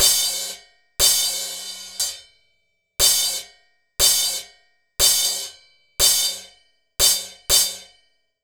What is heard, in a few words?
test cymbal choking